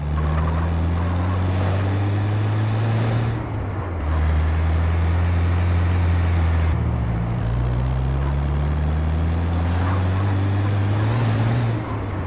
silnik van

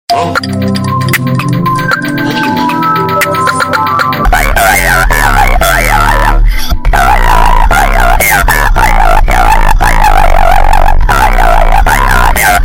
dzwonek

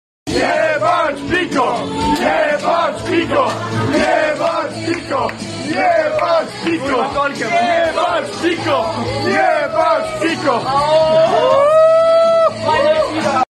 fajny dźwięk na alert

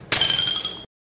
dzwoneczki; familiada

